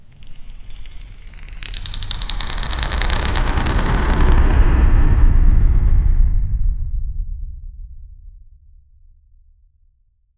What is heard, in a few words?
sinuous slithering sound, reptiles